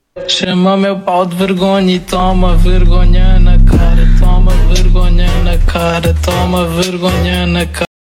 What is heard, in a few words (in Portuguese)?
peido